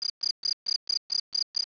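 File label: cricket; World Racing 2; XBOX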